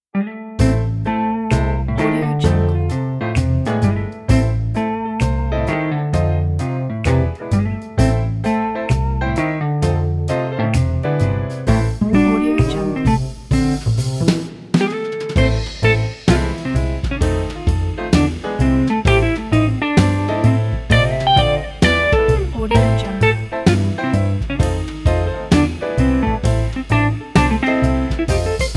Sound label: retro noir jazz